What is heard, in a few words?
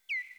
noctule, bats echo; britishbats